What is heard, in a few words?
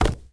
bodyfall marble; foley